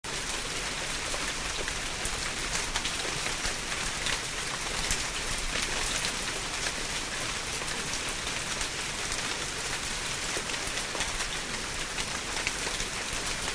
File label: Rain Heavy Rain On Fol, raindrops, splatty